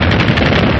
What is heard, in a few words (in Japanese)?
kikannzyuu